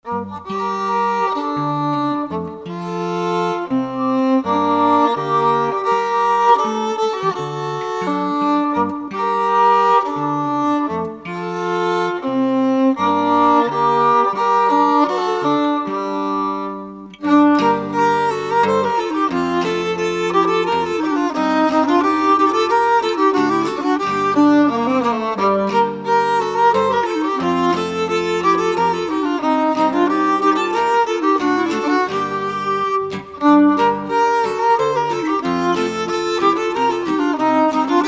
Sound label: polska swedish trio